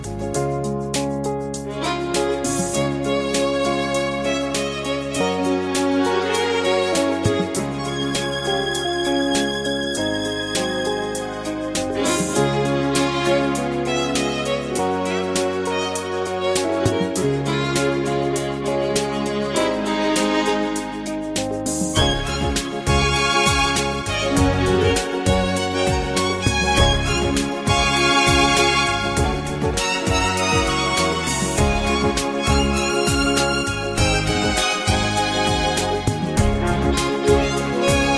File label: Modern Ballad